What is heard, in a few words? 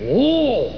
oooo